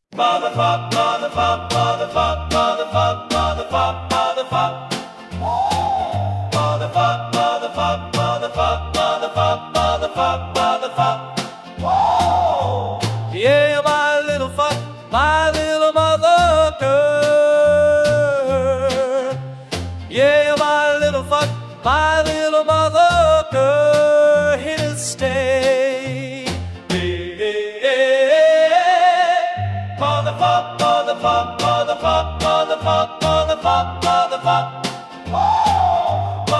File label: doo wop funk